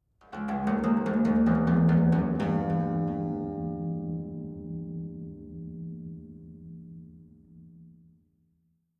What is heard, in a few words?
Savannah Music